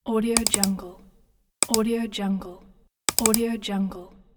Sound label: mouse click; terrasseproduction